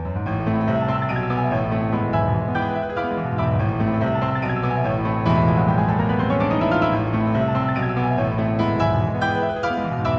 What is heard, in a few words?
i phone 6s piano hyper ringtone